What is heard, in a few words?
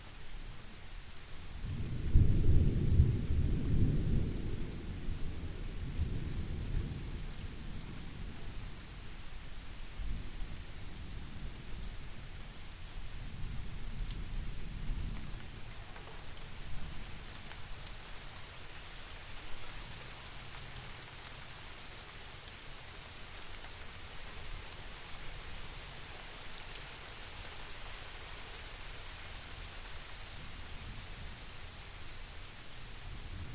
thunderstorm